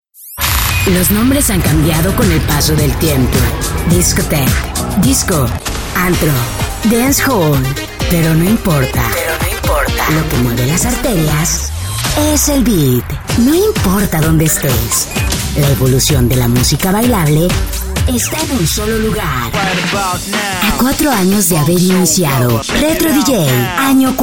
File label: retro dj